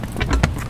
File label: Triple Thunk